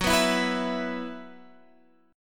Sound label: B Suspended 4th Gb